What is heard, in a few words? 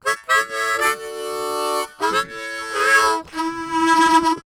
Harm 098bpm A, Harmonica; Quazibeat - African Secret